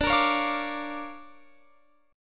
D Minor Major 7th Sharp 5th, D, down, Guitar Open F; strummed